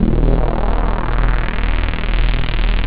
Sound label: analog, synth